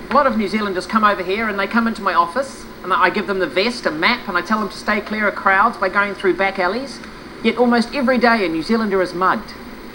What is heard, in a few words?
crowds